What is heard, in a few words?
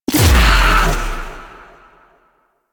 Whipclaw; Warframe; Khora